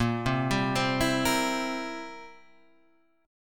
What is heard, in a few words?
C 7th Bb